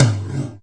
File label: GATE TOM, Gated Reverse Slapback; drums full kits; style kits